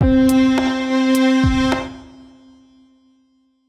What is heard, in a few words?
wave Complete; calamity-cobra-2